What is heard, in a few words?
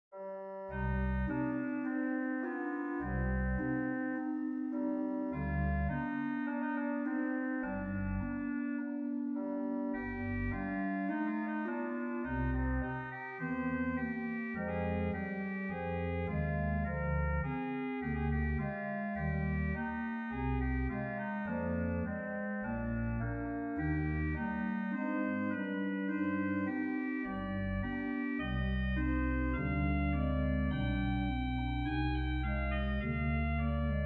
oboe melody, organ; oboe 8' & rohrfloete 8'; St-John; demo for St-John the Evangelist sampleset